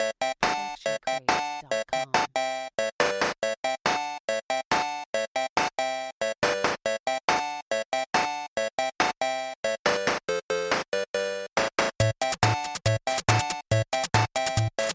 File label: Chiptune Tick Clap Looping; SoundsCrate